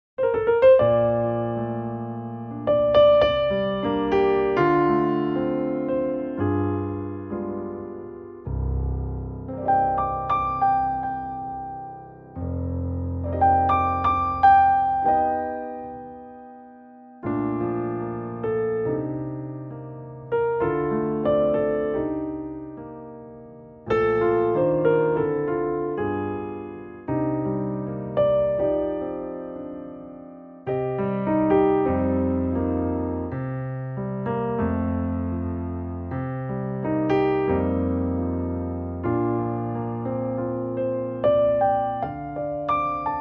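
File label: piano B flat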